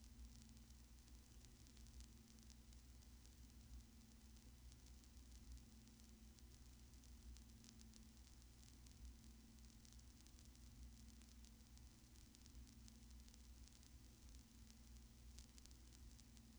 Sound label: Silent Groove, Ultimate Analogue Test LP; Grace Digital AVPUSB01S